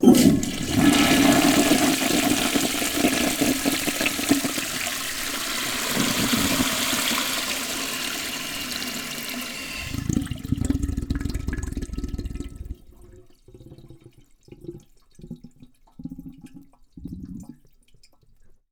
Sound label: flushometer